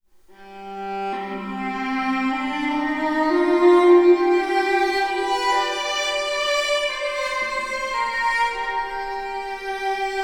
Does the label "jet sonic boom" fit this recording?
No